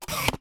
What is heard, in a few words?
Camera Single